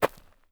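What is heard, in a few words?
gravel, steps; Terrarum; mining sounds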